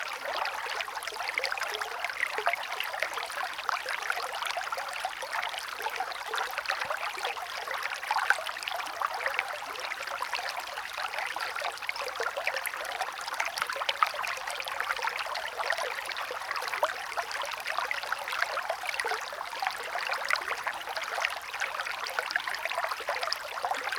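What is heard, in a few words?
loop stream water mountain; UltimateFishing2020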